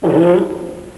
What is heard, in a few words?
HUMPH